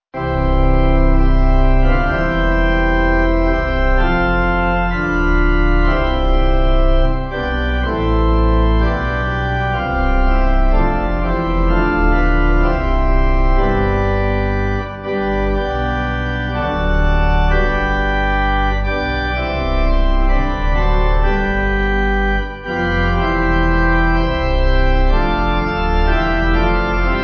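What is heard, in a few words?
Pipe organ, church music backing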